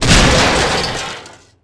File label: hit trash can debris; hits; Tony Hawk's Project 8; XBOX